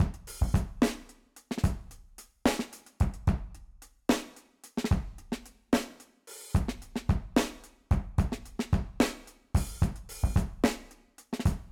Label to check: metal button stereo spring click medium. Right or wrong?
Wrong